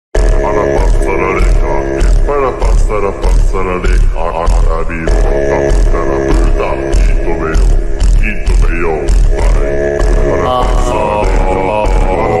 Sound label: Japanese Version slowed reverb